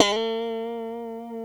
FUNK SLD, STRAT; Guitars; Spectrasonics; Hans Zimmer Guitars VOL-1

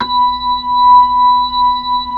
B-3, Hollow; Keyboards of The 60's and 70's

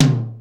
MD TOM; Hollywood Edge; The Big Bad Beatmachine; XR-10

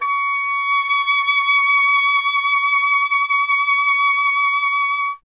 WND OBOE, Solo Instruments; Miroslav Vitous - Solo Instruments VOL-2

